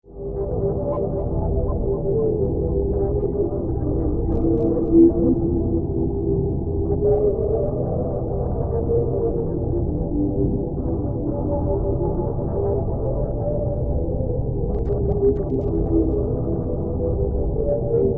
Drone Scifi Mystical Dreamy Atmosphere With Voices; Tonal Ethereal Voices Magic